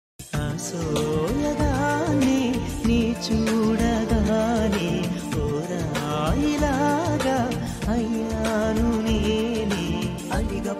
love song ringtone

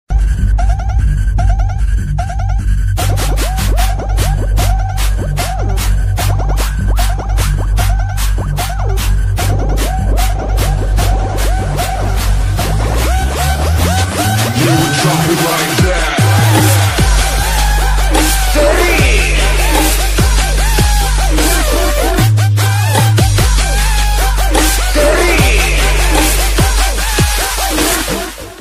bgm ringtone, tamil ringtone; ajith; vedalam

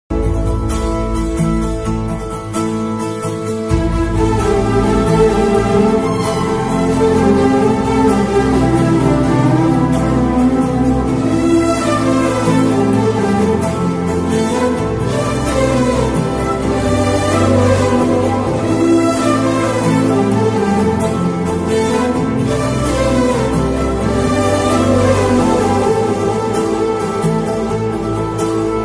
telugu ringtones bgm; radhe shyam

